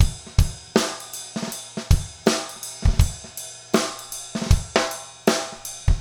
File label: trip hop